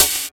Dampened Open Hat One Shot F Key, Royality free open hi hat one shot tuned to the F note